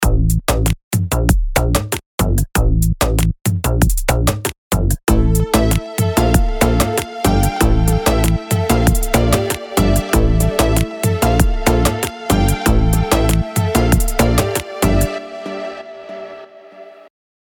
perc electro

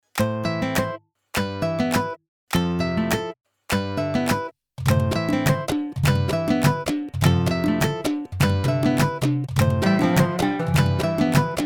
khaliji

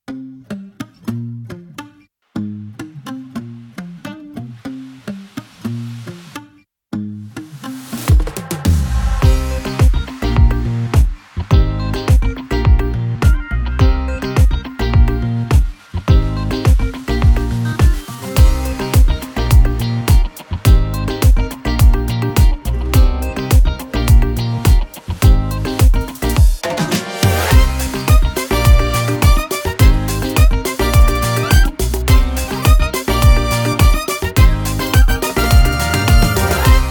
funky

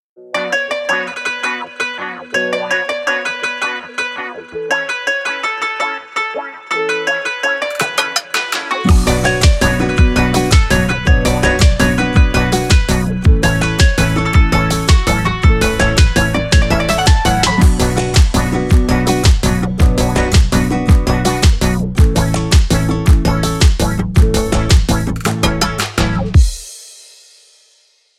wah wah

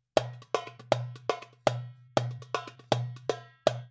120 Bpm Darbuka Rhythm

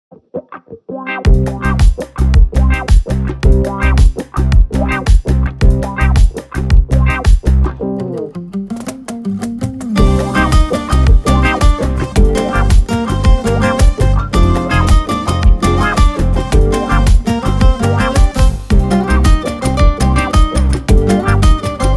Wah Wah; Aleppo Studio

